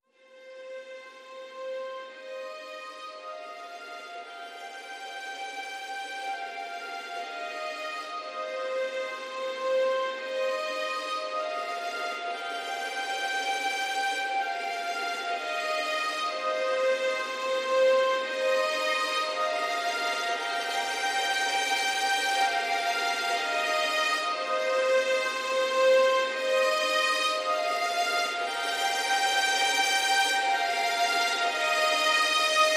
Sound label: Strings Mix